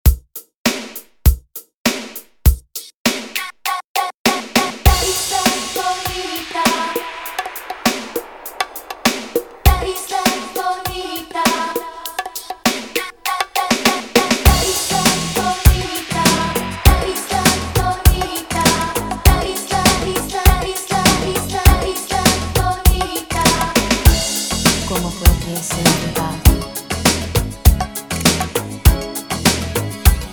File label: intro 100bpm